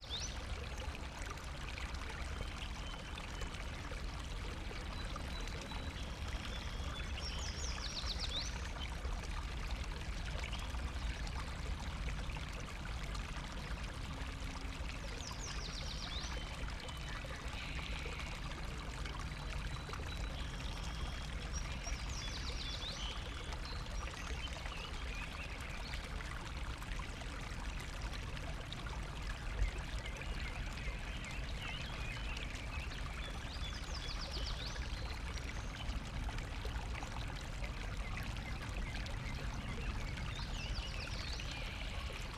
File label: Ambient; Atomic Adam: Episode 1